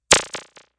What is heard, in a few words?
Squiggle; Squiggles